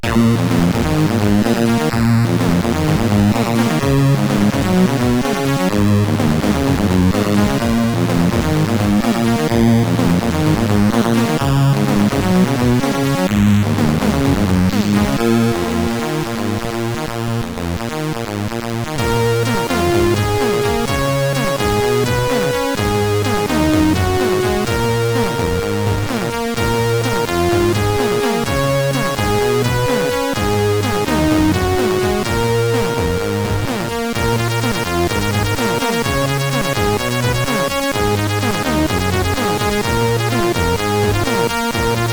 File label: Heavy Guitar, sid CSG8580R5